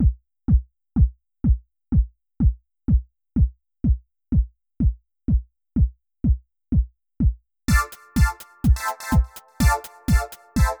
Fishy Trance in the Ocean Dance

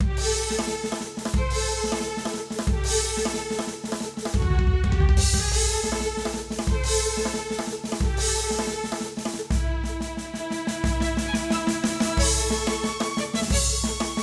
Violins Drums remix; Darius Twin

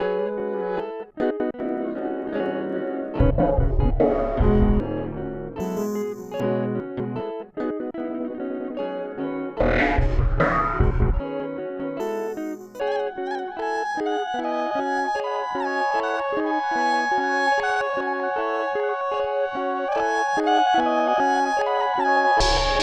guitar

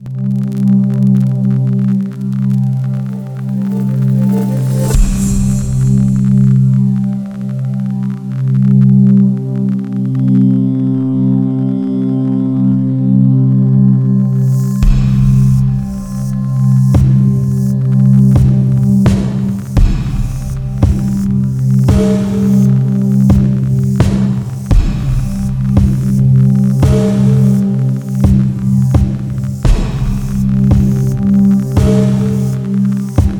ambient

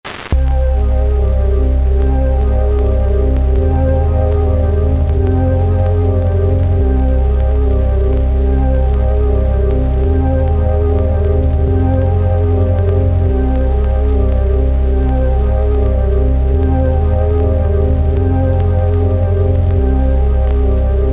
cellular cellar cello cell

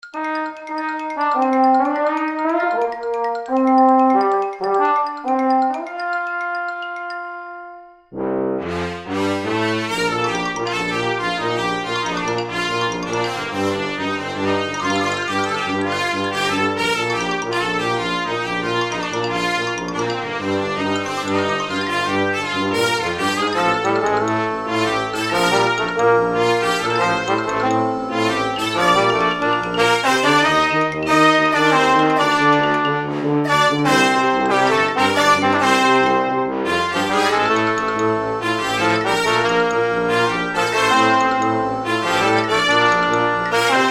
Bubble Brass y Bobbles

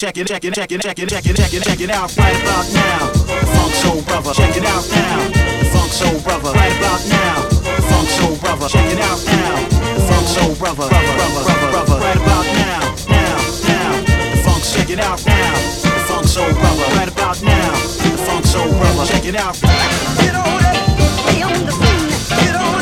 swing funk

